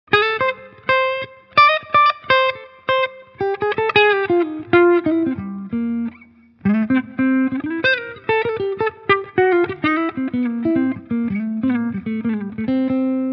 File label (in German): PRS DGT Hals HB SC Clean; PRS David Grissom DGT